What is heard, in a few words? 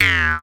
Boing, cartoon; Classic SFX; musicradar; cartoon caper